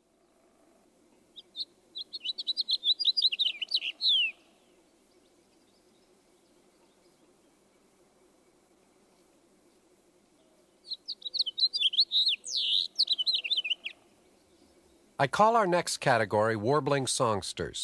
Warbling Songsters- House Finch, Purple Finch, Warbling Vireo, Orchard Oriole; Birding By Ear